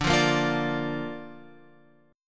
D Minor Sharp 5th D; Dm#5 Chord {10 8 8 10 X 10} strummed

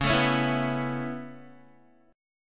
C sharp Minor 7th Sharp 5th C sharp Guitar; C#m7#5 strummed

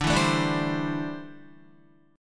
D 7th Suspended 2nd Suspended 4th D, Guitar; D7sus2sus4; strummed; 9,7,9,7,7,8

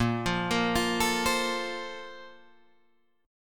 A sharp Major 7th Suspended 2nd, Guitar, Drop D

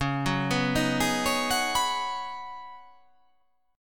C sharp 9th Suspended 4th C sharp down, chord

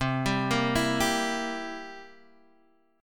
B sharp 7th B sharp; arpeggiated; JGuitar

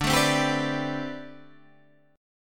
D 13th Suspended 4th D; D13sus4 strummed; JGuitar

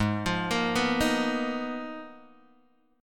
Ab Minor 6th Add 9th, Ab, Guitar, Open G

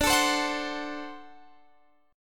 D 7th, down, Guitar, Standard; strummed; capo=2

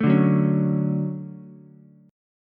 D-Major 7th, D, Ukulele; strummed

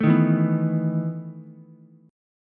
E Major 7th Suspended 4th, Ukulele; strummed; JGuitar

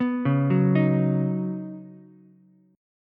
Db 9th Suspended 4th Db down Ukulele C6; arpeggiated; JGuitar

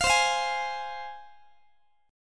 C Suspended 4th Sharp 5th C, Guitar; Csus4#5 strummed; x,x,20,22,21,19

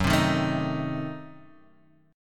F sharp Diminished 7th F sharp Guitar Standard; strummed; JGuitar

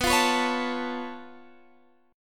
C sharp Augmented 7th B, chord

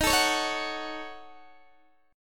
Eb Minor 7th Flat 5th, Guitar Open D

